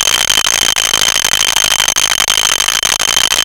ELECTRO; E-MU Formula 4000 Series Vol. 3; Analog Odyssey

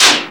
Perc FX, Percussion; Dance Synths

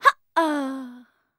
VOICE, EASTERN FX; ILIO; Vocal Planet

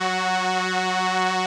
SOLINA, String Machines

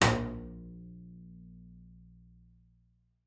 A#1, bass pizz; Xsample VOL-5

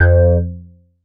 bass tweak glass F; Polyend; ARC Noise

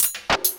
202 DERI HH, CYMBALS, Industrial Loops; USB Soundscan vol.24; AKAI

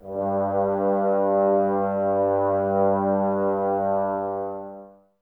BRS TBS G, Woodwind Brass Ensemble, Brass; Miroslav Vitous - Woodwind Brass Ensemble

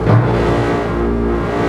A#3, symphony; Northstar - The Wizard VOL-1

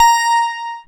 A#, PIANO; Big Fish Audio; Synclav FM

